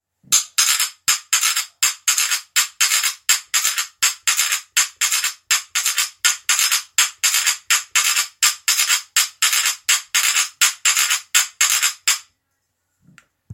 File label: Rytme 3 concha; Ateliers chant